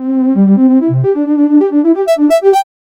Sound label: notification sound, retro